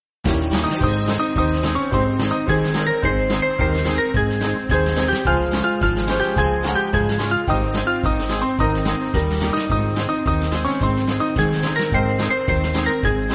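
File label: Band, Music Backing Files for Churches; Small Band; American Melody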